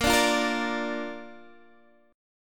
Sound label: A sharp Major, down, Guitar, Half Step Down